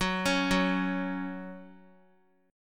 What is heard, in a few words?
Gb 5th, Guitar; arpeggiated; 4E,4A,5D,5G,5C,6F